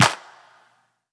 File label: Claps; Vengeance Samples